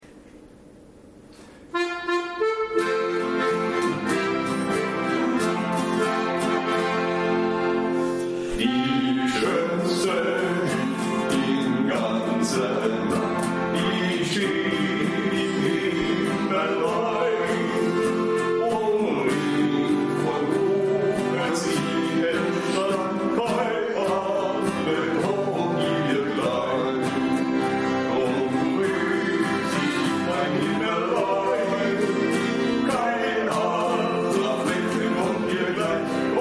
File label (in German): Hüttenlied gesungen